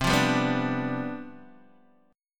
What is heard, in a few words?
C Major 7th Suspended 2nd Suspended 4th, C, down, Guitar, Open D